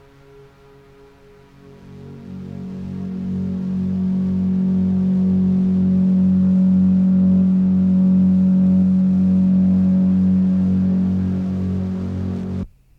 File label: blimp